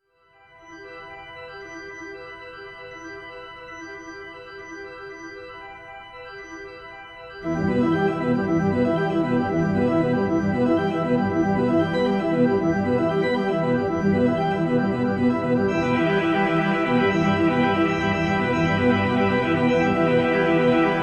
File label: arpeggio